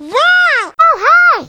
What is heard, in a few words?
hunter warn; huggy wuggy snpc; elmo